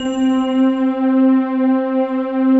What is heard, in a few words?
GLASS PAN, pads; Pro-Rec - Synthsonic